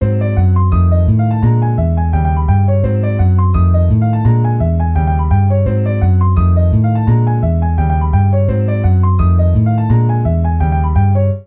ii harms for sound maj

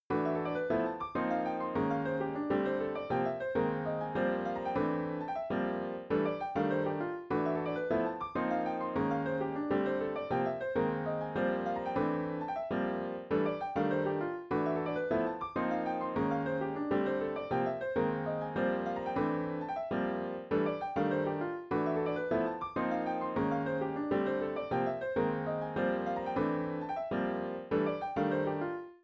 iii-vi-ii-v fourths harmony c

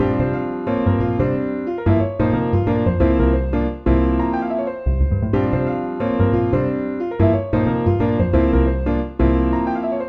harmonizations

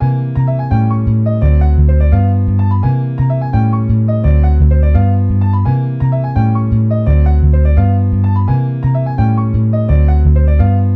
ii harms for sound maj

